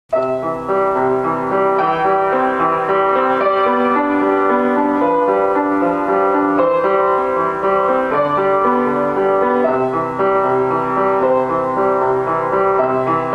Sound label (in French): Melodie